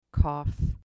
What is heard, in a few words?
cough